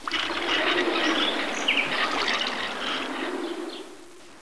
WELL; Caesar III

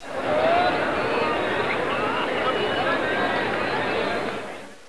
house mid; Caesar III

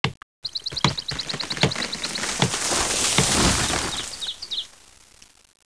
TIMBER; Caesar III

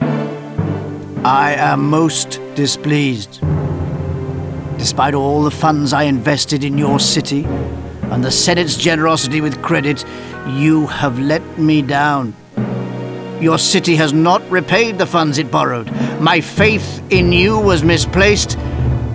lose game; Caesar III

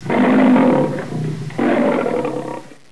LION PIT; Caesar III

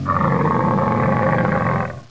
CAMEL; Caesar III

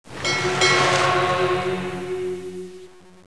temple ship; Caesar III

